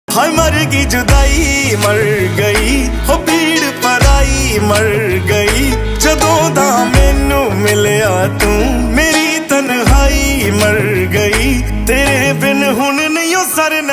punjabi ringtone

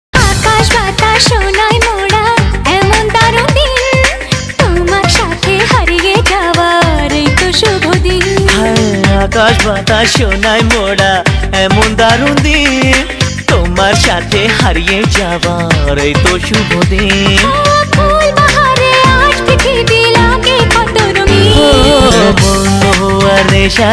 bengali ringtone

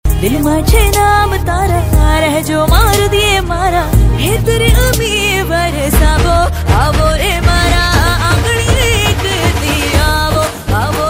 female gujarati ringtone

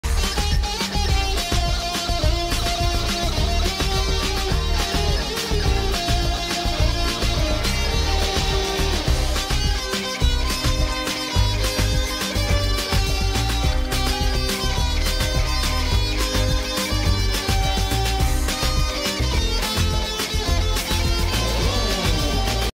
instrumental BGM ringtone; Main Hoon Na

